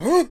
Male Surprised

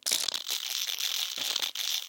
Spider Jump; peace death 2017 pc

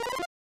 Menu Sound Effect; final fight 2 snes

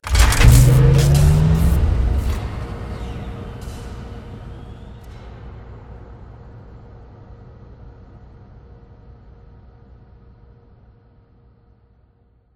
power turn on

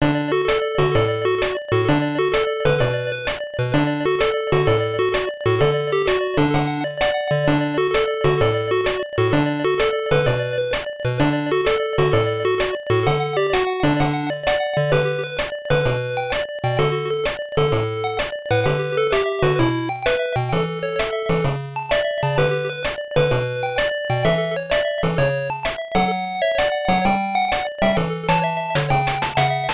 Game Failure

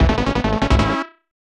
Goal; On the Ball; Cameltry; SNES

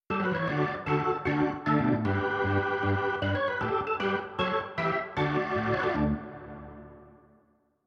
organ

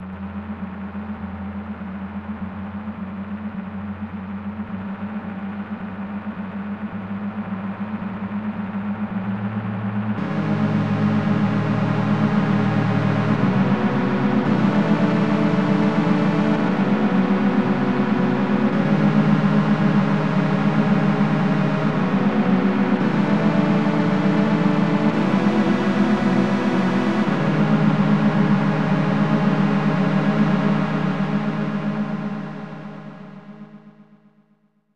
Noise; Hansei Zarujirou-kun no Daibouken; Spanky's Quest; snes

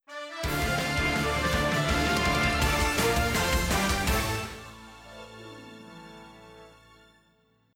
Music Success Stinger; Zak Storm Super Pirate; mobile

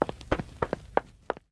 Footsteps Wood Run; The 39 Steps

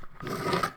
BUCKET TAKE; don t be afraid the first toy

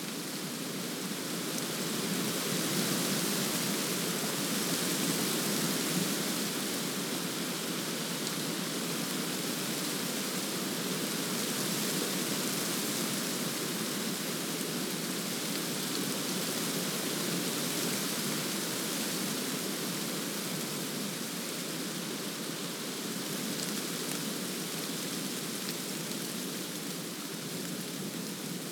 Amb Wind Long

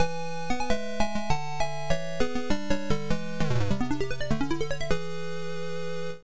Level Complete; Relevos Snowboarding; MSX; Nailed It

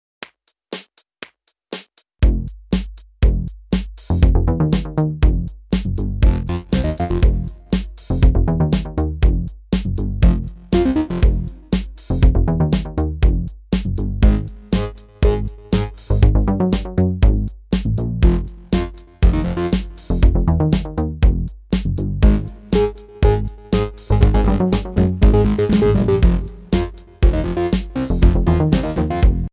Electronic; American McGee Presents Bad Day LA

